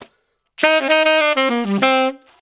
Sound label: funk solo E, духовые